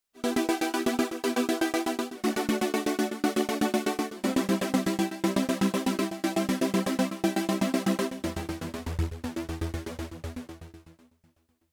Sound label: Morse Coder Trem